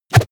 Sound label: whoosh air hard fast